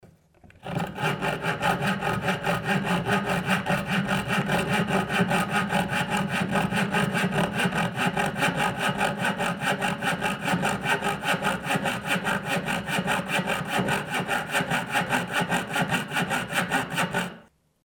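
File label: jigsaw sound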